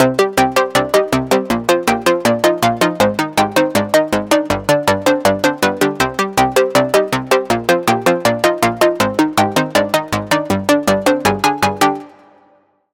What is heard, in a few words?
Pluck; Footprint; Routed Sound; Spire Expansion - Hyper Gamer Vol.1